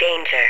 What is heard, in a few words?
sound danger